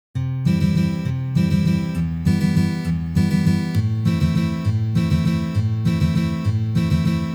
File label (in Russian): Valsovyj boj na gitare